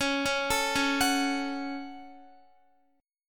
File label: C sharp Suspended 4th Sharp 5th, Guitar; arpeggiated; chordshape